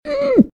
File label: about To Drown; RadwinIsland